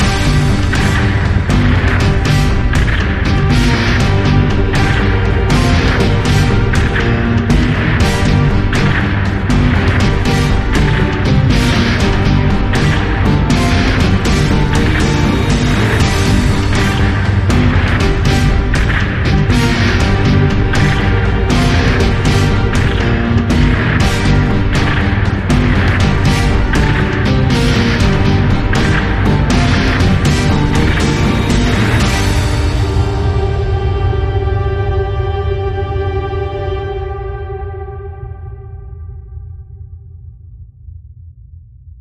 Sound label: Gothic Action Music; bbc